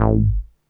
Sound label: NASTY BASS; Masterbits - Vintage Voltage; VV BASSES